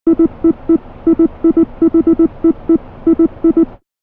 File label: vital presets; chiqui audio; vital fx presets